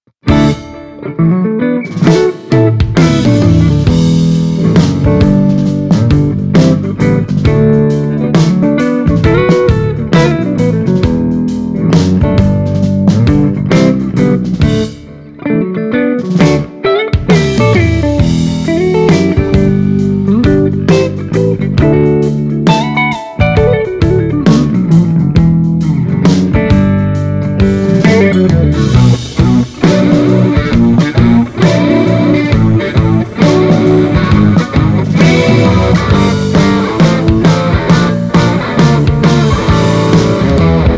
Mini Rox Mic Inside Les Paul

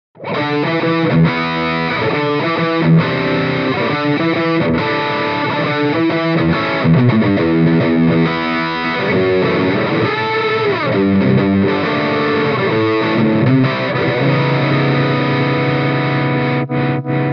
Eminence Legend GB128 Distortion Les Paul

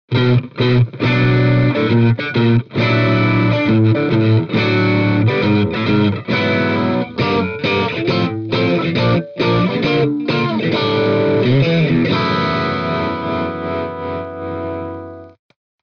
Celestion Greenback G12M Crunch Stratocaster

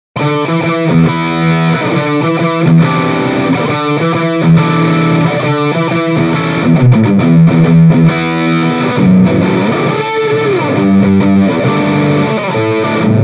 Mic Inside Seventy 80 Distortion Les Paul; Mini Rox

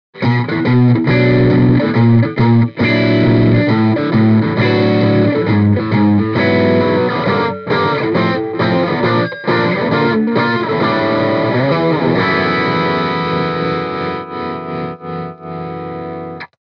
Mic Inside G12H 75 Crunch Les Paul; Mini Rox

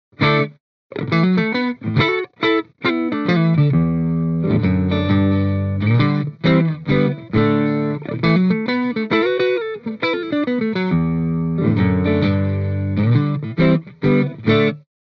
Celestion Vintage 30 Clean Stratocaster

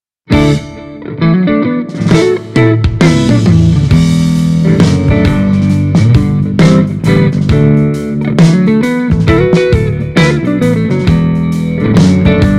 Mic Inside G12H 65 Stratocaster; Mini Rox

